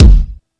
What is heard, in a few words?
Kick Gate, Yamaha PSR E305